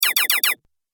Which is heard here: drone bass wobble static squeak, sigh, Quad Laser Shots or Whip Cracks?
Quad Laser Shots